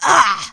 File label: attack, Zombies; zps; Z lea